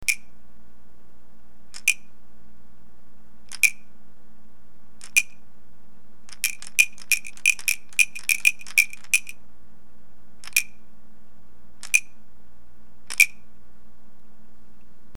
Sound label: crickets